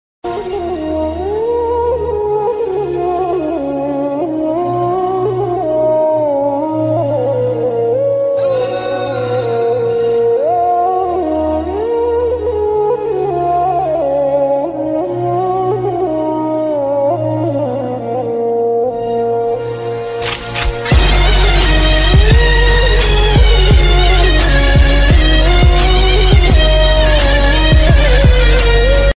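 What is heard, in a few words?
Turkish March Bgm Ringtone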